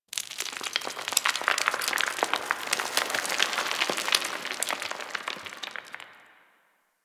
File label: Stone Crumble; Dagath; Warframe; Ambience; Clan Dojo; Dojo Misc